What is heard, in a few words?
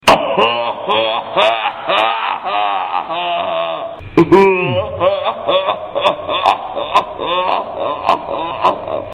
Slomotion Baby Laugh